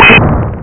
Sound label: Bullet Clash; Orb Mazez